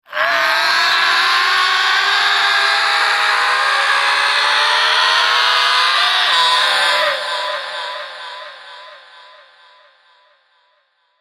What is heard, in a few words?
Tormented Souls, Tortured painful male screams to make your blood run cold with delay; Stingers